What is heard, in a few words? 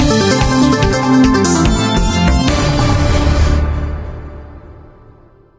jingle